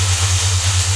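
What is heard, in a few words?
lightning gun during fire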